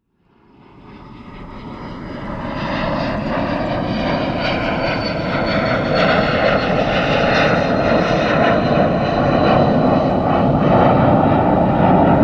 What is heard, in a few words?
Take Off; Redmark